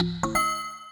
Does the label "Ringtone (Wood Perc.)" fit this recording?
Yes